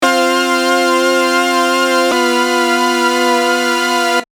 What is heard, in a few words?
Dm7 G7